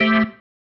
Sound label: Stab, Organ, One Shots